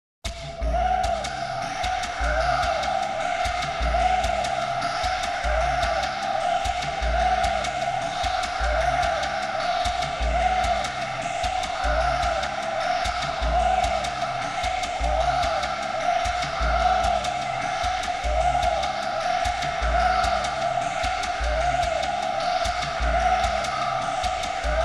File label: weird bird call carnival